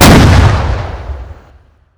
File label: Vehicle Weapons; Red Orchestra 41-45